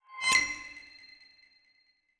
ui quest cup easy

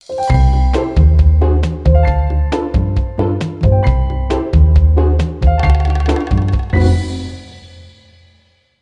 Bari Sax

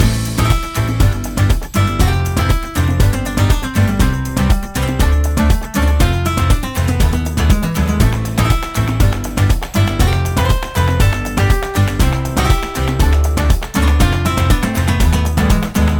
BGM ramen

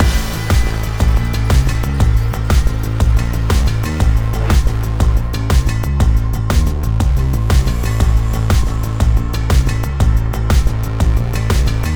Military Base Action; Level 7; N.O.V.A. 2 The Hero Rises Again

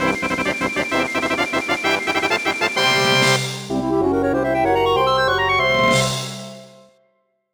Puzzle Completed ~ World Door Unlocked; Banjo-Kazooie

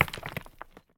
dirt

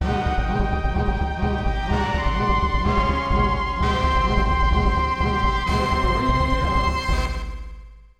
Prehistoric Realm Fanfare; Glover; Nintendo 64

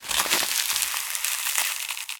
frozen; Scrat

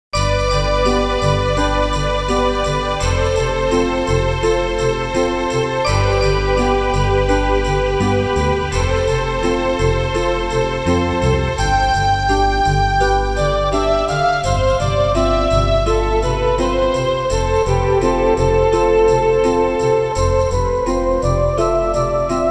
Instrumental

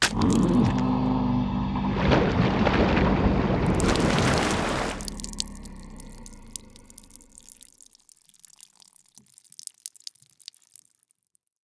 Slobber Death

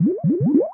Bubble; Buggy; PS1

